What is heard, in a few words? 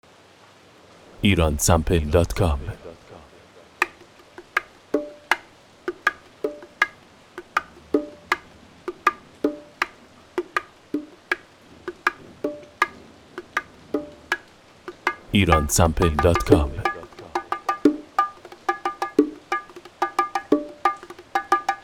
Bongos